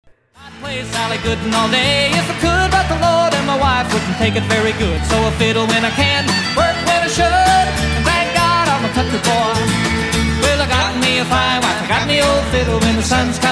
dansmuziek

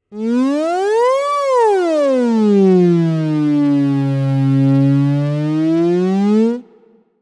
achtbaan

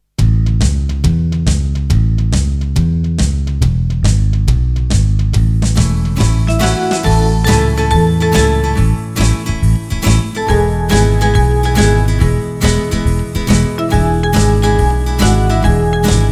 slaginstrumenten rock zonder zang

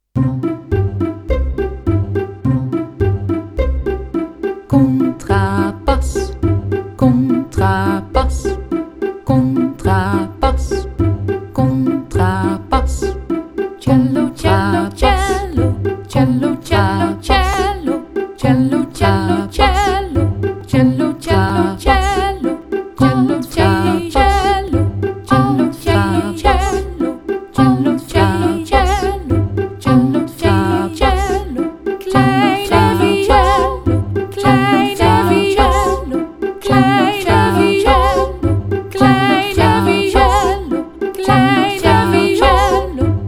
strijkorkest met zang

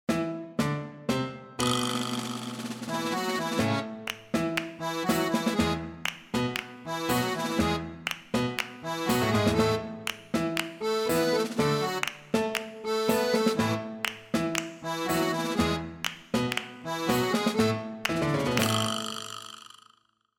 zonder zang

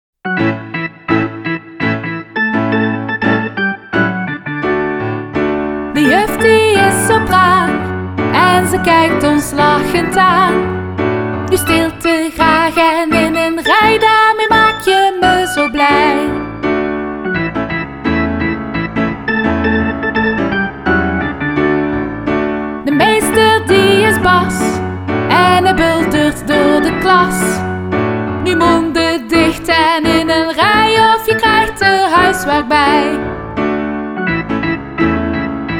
in een rij met zang

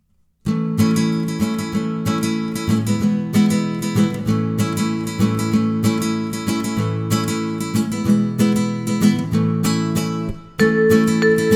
zonder zang

